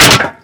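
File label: destroy fire extinguisher; Explosions; Call of Duty Modern Warfare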